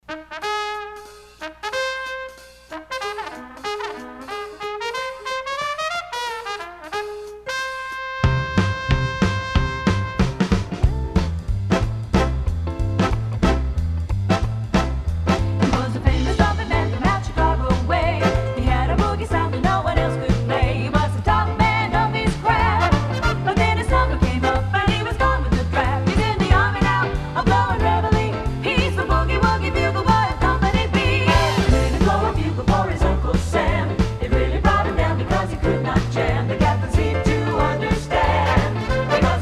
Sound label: Vocals; Adult Solo Practice Songs; Black Tie America